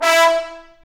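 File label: Trombone Section, Brass Sections; Roland LCDP06 Brass Sections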